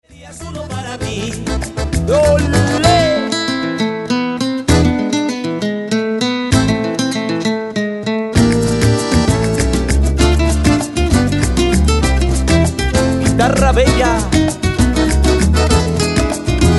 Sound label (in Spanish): Buleria espanola